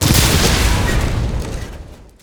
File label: Explosions Total Destruction of War Machines